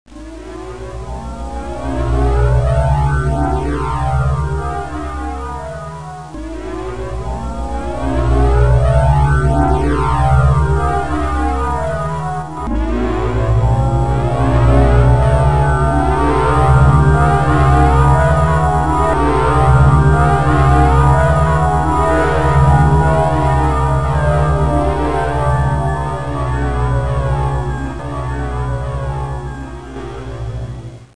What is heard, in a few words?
beam up; Xanthus Preview; Interesting demo's; World Of Mac 1994